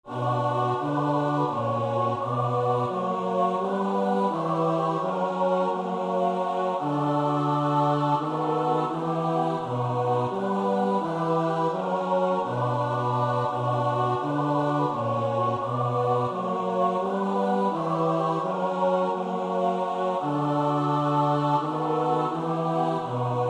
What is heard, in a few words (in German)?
Ich falle dir, mein Gott (S-A-T-B); Wolf (Ernst Wilhelm); Jesu deime Passion; 08.Ich falle dir, mein Gott